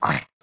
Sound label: monster, creature